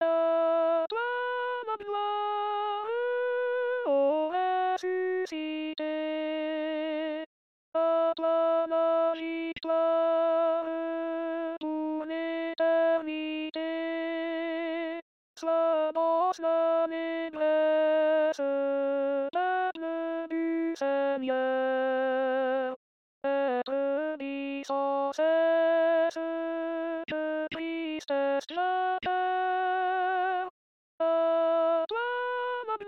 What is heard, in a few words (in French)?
alto, chorale; a toi la gloire